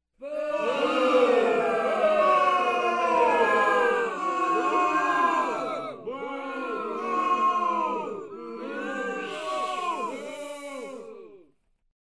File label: boo